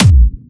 Clubby Kicks, Bassdrums

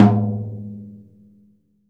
P.MLTOM MP, VELO TOM

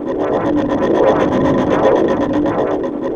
GEIGER; Spectrasonic Distorted Reality 1

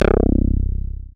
dance bass; Sound & Vision - Gigapack II CD 1

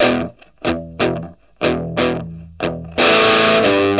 Vintage Blues Guitar, 60, E; USB Soundscan vol.22; AKAI

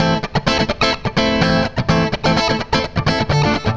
FUNK, FUNKY GTR

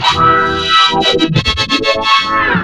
HIT BRASS

